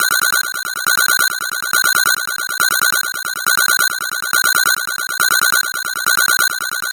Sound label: science fiction console alarm; sound effects one